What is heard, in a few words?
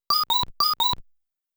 Chime Sound